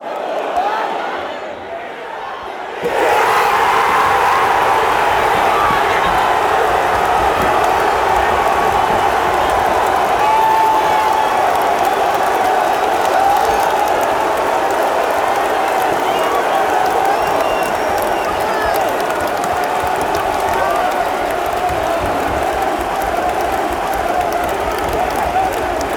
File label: goal southampton fanchants